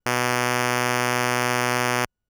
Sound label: Pulse B B3; fleet keys Samples